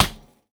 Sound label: Foley